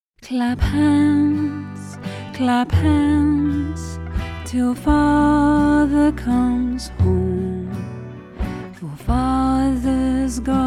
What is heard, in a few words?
Clap Hands Clap Hands; Clap Hands till Father Comes Home